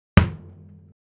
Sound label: Bass Drum Hard Kick